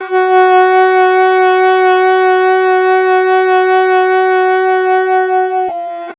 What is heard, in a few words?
sax mod added back